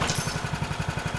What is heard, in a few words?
draw, weapons; cso; turbulent7